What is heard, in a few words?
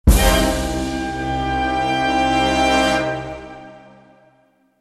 Surprise; mafia